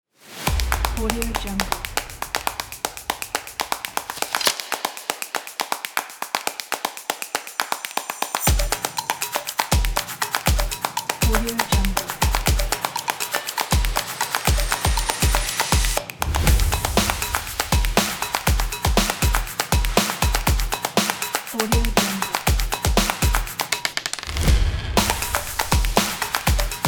percussion

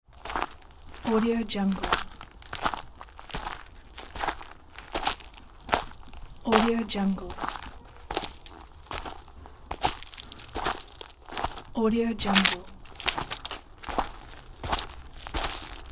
footsteps on dirty ground

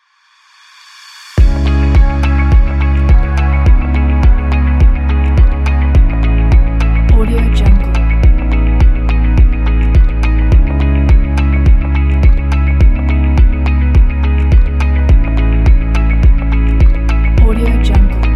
chill dreams

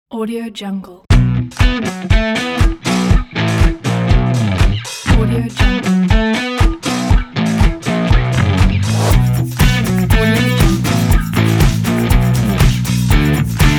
powerful upbeat rock intro logo pack

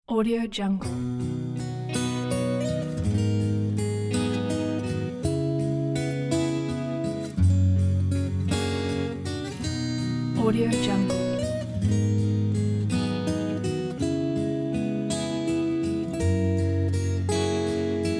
old vinyl

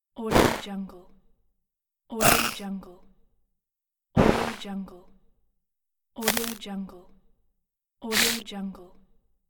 recycle bin emptying

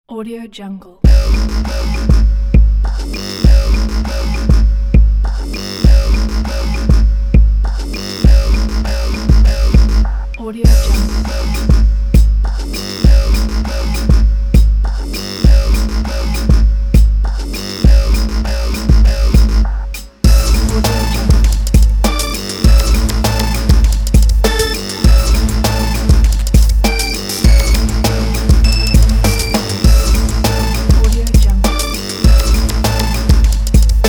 dubstep hiphop; evanni

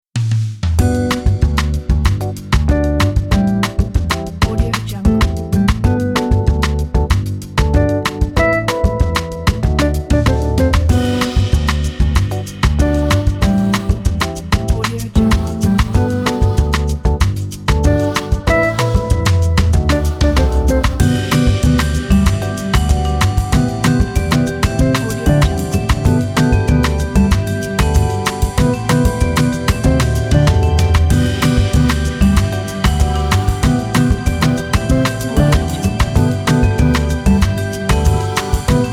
waiting music

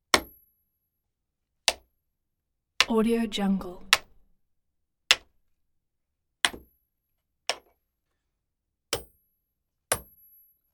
metal hammering

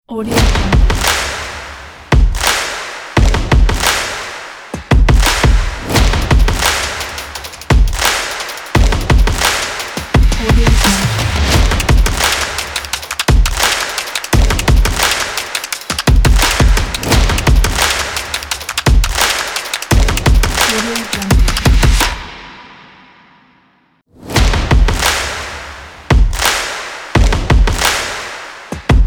this is stomp

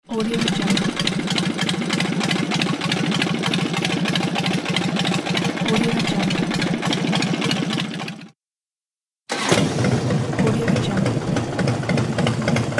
idling of the motorcycle engine

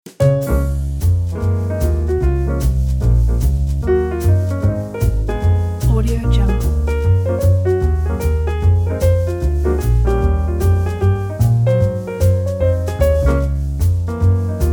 luxury lounge jazz pack

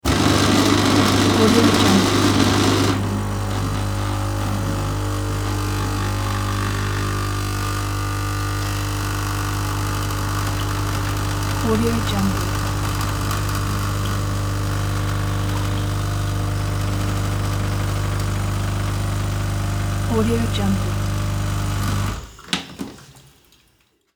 old coffee machine

